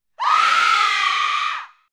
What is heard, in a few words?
witch, zombie; dod